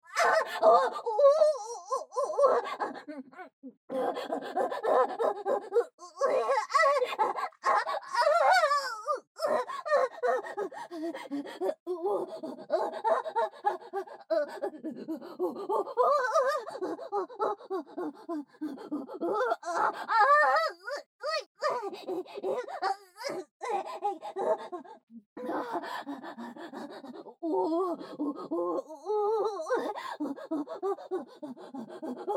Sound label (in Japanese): バック オホ声